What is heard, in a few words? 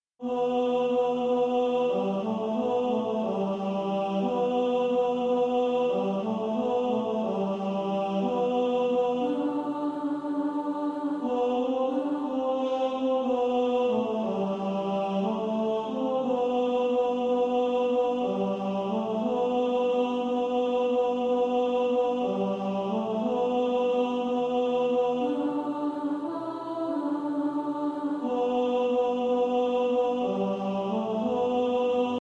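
We three kings ten, tenor, choir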